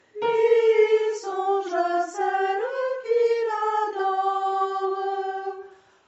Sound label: tutti